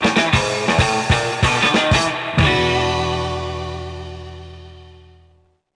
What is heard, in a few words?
On Foot Victory; bully scholarship edition